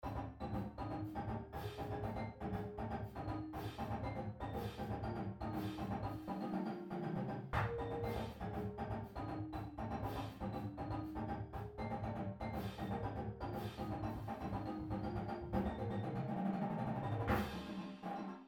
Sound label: Double Beat, Drumline; Drumline Exercise; pdlmusic; Drumline Ensemble Series